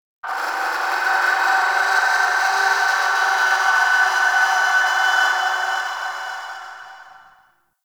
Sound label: Void Ghost Capture Started; Void Tower